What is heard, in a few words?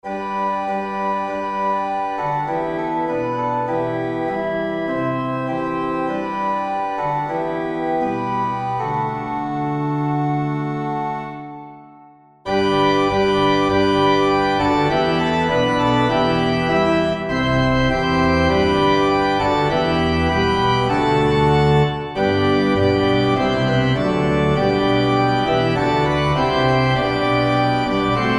Sussex 4 verses Organ